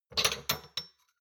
shot glasses grab multiple medium; berufe; gastronomie; soundpool

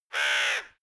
crowing; natur tiere; voegel

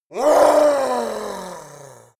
yeti attack shout aggressive command; schreie

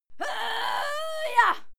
female fight scream yah struggle; schreie; menschen

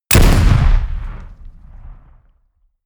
shot

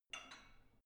barbell only lift up soft, fitnessstudio

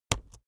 keyboard laptop enter press slow hard

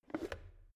plastic water boiler lid off soft; maschinen; wasserkocher; soundpool

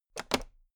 toolbox latch open regular

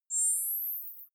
zoom out light high; kommunikation; signale

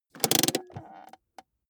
car bmw e46 interior handbrake pull up creak

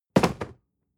cardboard box medium fall high; objekte

